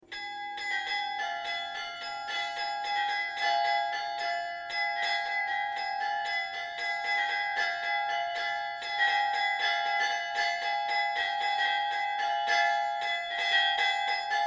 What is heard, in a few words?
cycle for carillon Solitery Bells; poetry impression by Jonas Mekas